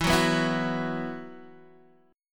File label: E Suspended 2nd E, Guitar, DADGAD; x,7,4,4,7,4